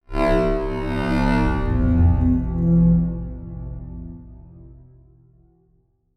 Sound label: Transition Distortion Power Source; Adobe; Production Element